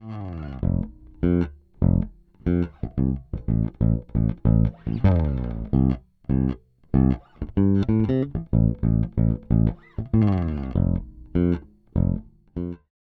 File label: all bypass; Zoom B2 Four